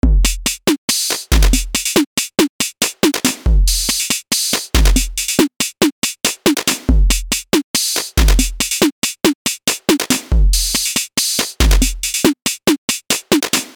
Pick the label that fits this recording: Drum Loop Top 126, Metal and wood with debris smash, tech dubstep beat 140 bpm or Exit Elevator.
tech dubstep beat 140 bpm